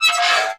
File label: GLASS SCRA; Rarefaction; Poke in The Ear With A Sharp Stick